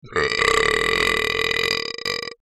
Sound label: brap; belches; I belched that all out at once, one take, no breaks.